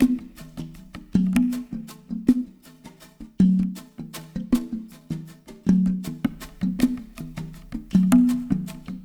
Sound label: TRANCE; Spectrasonics - Liquid Grooves 1 & 2; EUPHRATES